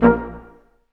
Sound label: BRS Quintet staccato, Symphony Orchestra